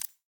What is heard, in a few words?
UI General Slide; bansonic; BANSONIC SFX